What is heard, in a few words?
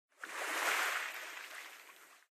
swim